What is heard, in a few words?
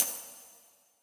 ui button click generic; tft